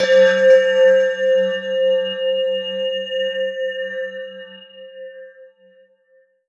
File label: Ensoniq ZR-76 Bellz C5